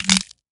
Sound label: Death Neckbreak; smite; god cupid skin13